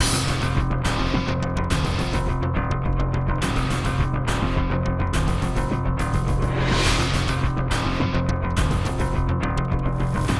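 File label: bass guitar build loop; transformers war for cybertron gamerip; IACON IN RUINS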